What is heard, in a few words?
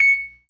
PERC, ORGAN; Keith Emerson Signature Series